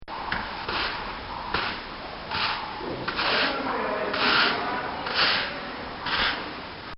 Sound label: Sweeping Barn; Buildings; Backgrounds; Bobby Cole